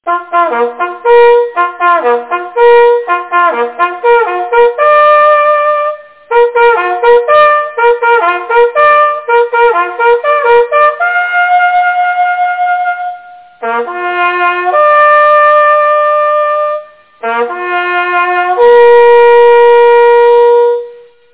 flyvende vildt, jagthorn; Askov Malt Jagtforening

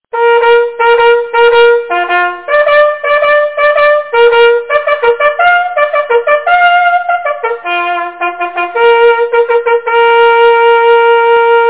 sneppen, jagthorn

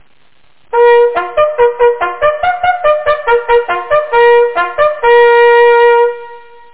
fremad, jagthorn

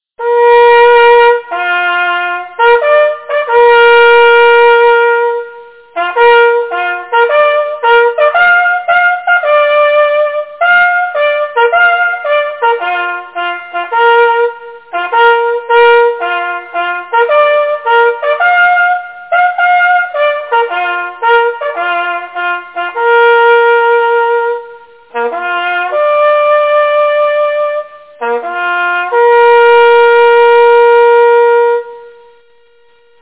jagthorn; fasanen

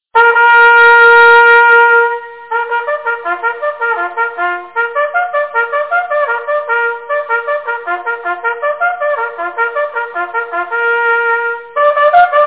forbundsmarch, jagthorn

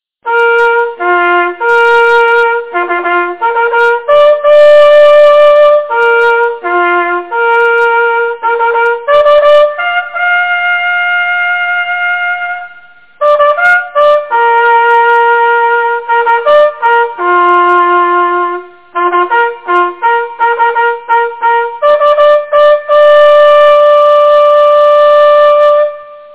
hundefanfare, jagthorn

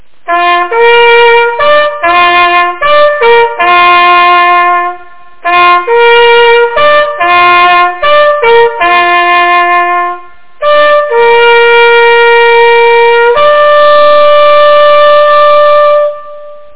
jagthorn; raadyret

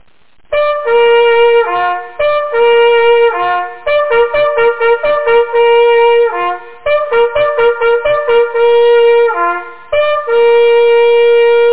haren, jagthorn

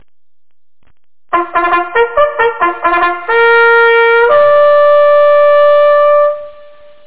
jaegerraab, jagthorn

